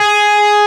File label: arp; osu